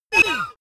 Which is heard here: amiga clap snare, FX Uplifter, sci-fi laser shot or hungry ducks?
sci-fi laser shot